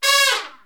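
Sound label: trumpets FX, Brass; Roland L-CDX-03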